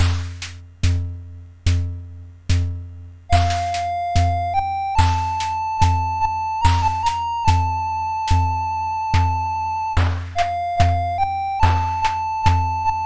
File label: MOS6581; MOS6581R4